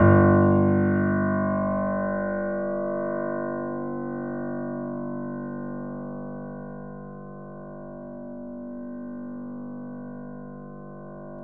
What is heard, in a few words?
G 0, Keyboards Acoustic, GRANDP ST; USB Soundscan vol.17